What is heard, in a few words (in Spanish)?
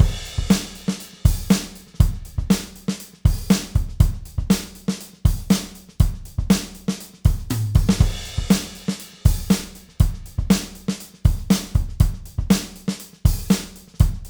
Loop sin procesar